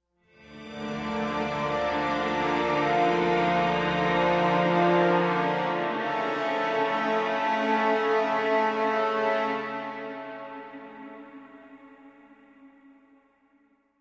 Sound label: Combat Stinger; state of decay lifeline